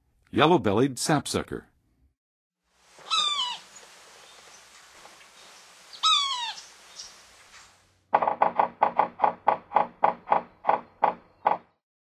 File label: Yellow-bellied Sapsucker; N. America; Songs of Birds of North America; Western USA Songbirds